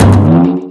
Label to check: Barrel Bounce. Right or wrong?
Right